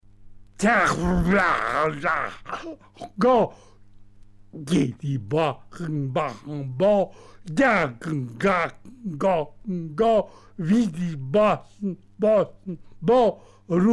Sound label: improvisation; ZajtrkAvantgarde